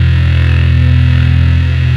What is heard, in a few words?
GTR DOOM, Synth FX Misc